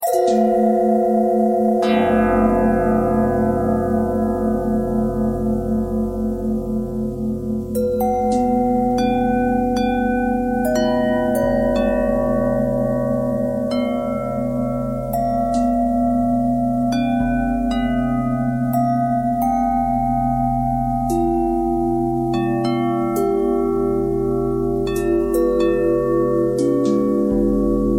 bell twist

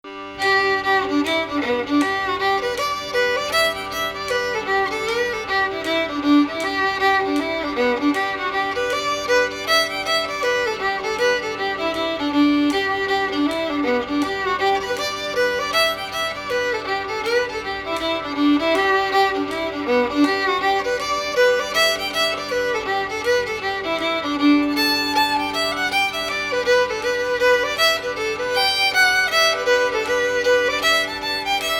banshee performance

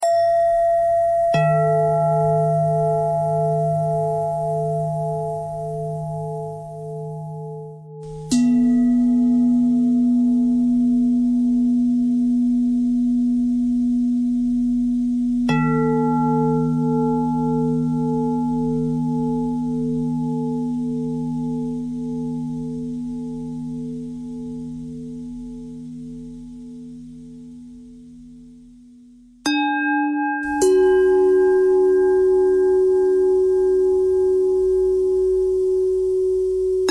bell bend threads

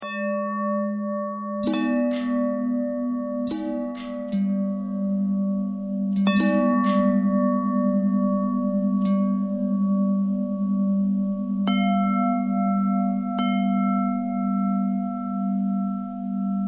long bell bell thread pentatonic, bells

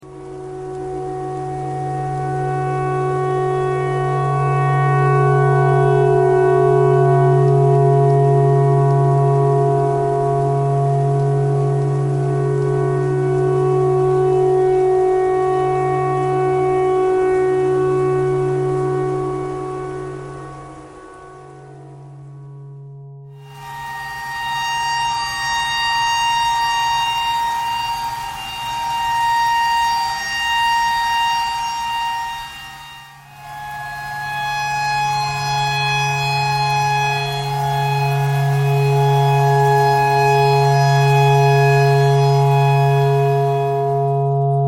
tornado siren clarinet thread pentatonic

